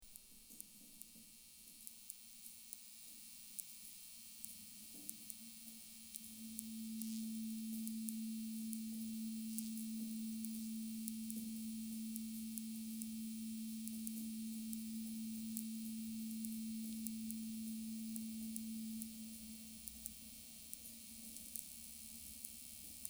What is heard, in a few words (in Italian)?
venerdi notte pioggia e recinzione elettrica; petropolis